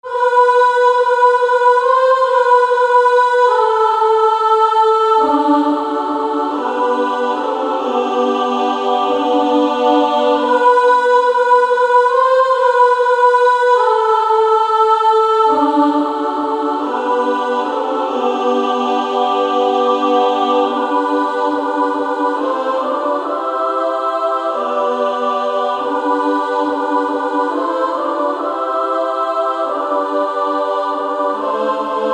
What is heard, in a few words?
Female Choir SSAA